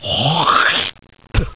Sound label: SPIT